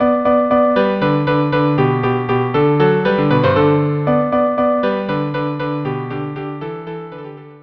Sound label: viola cello duo; Rossini; 5 duos no 5